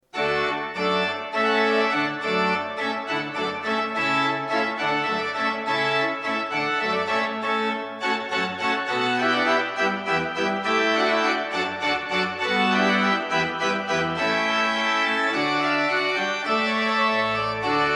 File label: hornpipe; gamle oppegård; vielse